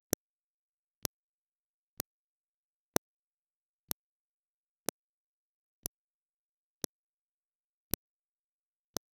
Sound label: a short soft click sound, button